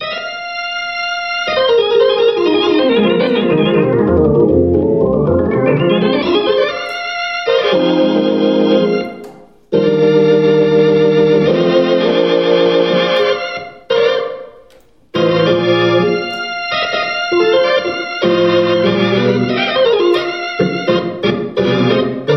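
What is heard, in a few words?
B3 Scream Full, rotary amplifier speaker; MOON Amp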